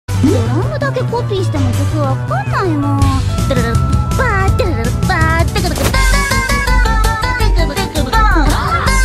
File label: drum noises